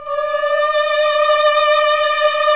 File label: MMH D#3, CLASSIC VOX